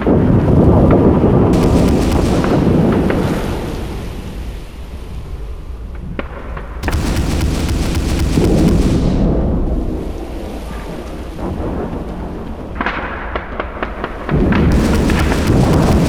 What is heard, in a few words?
Opening Barn; mohaa